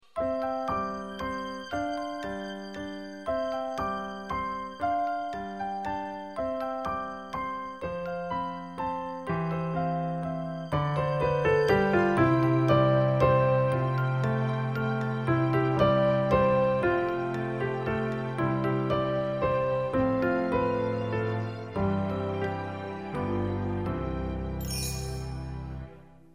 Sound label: Wishful Waltz